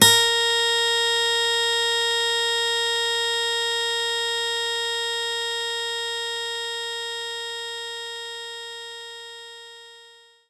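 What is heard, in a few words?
Steel Guitar A#5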